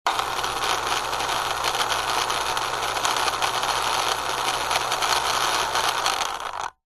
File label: science fiction robot small rattly tracks wheels motor whirr spin; zapsplat